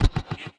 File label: Perc, drums; Touch Loops; BlissedOutBeats